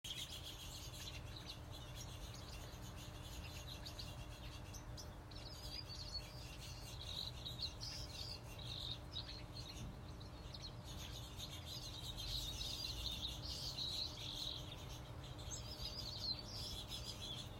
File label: White Crowned Sparrows and Finches